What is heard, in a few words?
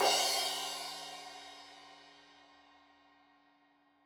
Crash 15in L edge, Cymbals Stereo